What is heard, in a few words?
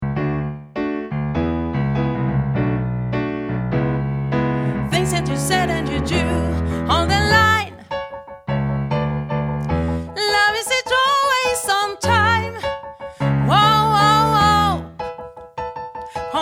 SOPRAN